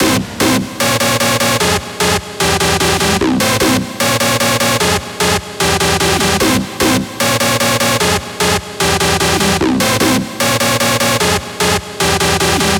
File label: Full Mix Cm 150BPM, Rave Melody; Ghosthack; 7 Free Rave Melody Kits 2025; Kit Code